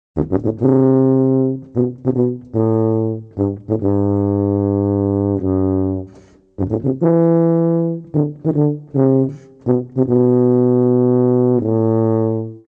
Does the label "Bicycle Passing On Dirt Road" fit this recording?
No